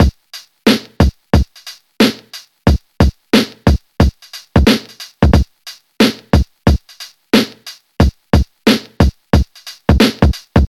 90 bpm drum loop c sharp key, kick tuned to the C# note